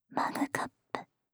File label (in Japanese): マグカップ, ASMR; シャッフル睡眠ランダム再生用; 無声音ASMR; シャッフル睡眠法で眠ろう; バイノーラル音声